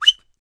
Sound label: New Whistle; Roland BK-5